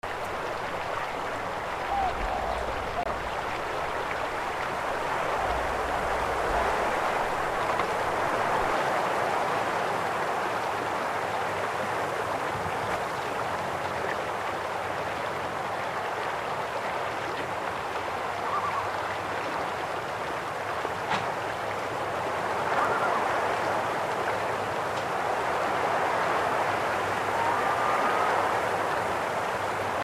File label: wind country river and birds